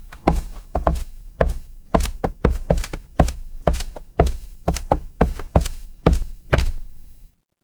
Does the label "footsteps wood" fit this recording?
Yes